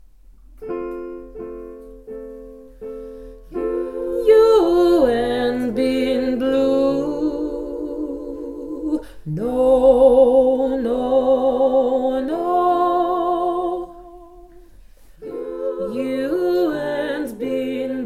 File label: basses